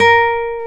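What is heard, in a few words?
classical guitar, nylon string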